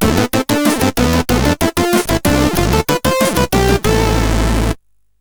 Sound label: Power sid CSG8580R5